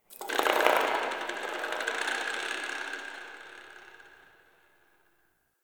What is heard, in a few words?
Covered Vine Area Creak; Misc Outdoor